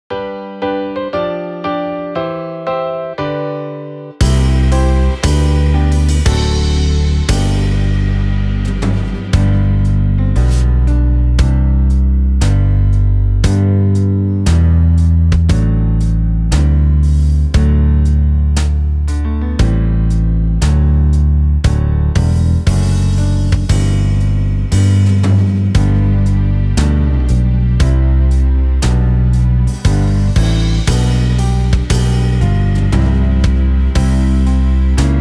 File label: God Is There Instrumental